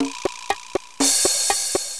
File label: Drum Song; Mythos